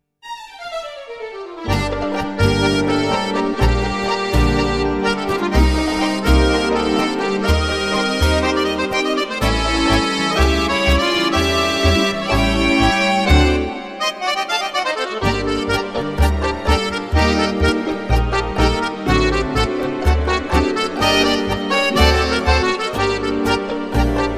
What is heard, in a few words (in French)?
tango